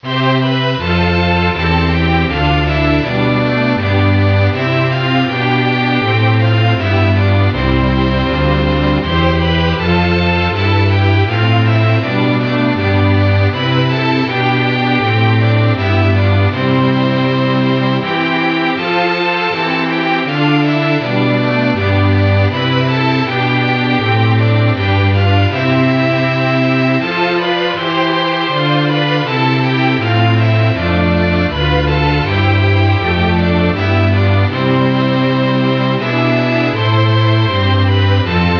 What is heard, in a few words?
anthem